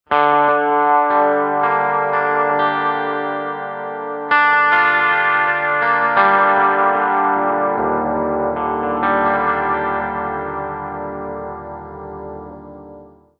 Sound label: G steel; Torchlight III; PS4